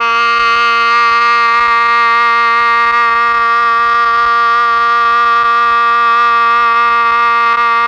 OBOE; ILIO; Propeller Island Legendary M400

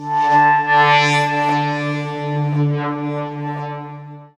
SYN SLOW, Roland; Sound & Vision - Gigapack I CD 2

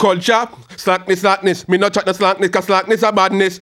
HAL RAGGAM, RAGGA-MUFF A maj

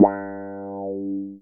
GUI MULT; EastWest; Dance Mega Hip Hop Electro

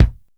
TING LOOP 74; AMG Gota Yashiki Groove Activator

